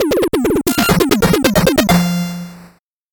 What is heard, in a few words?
8bit jingle normal